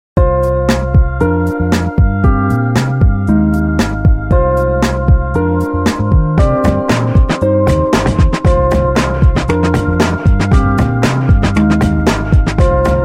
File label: core the orchestral lite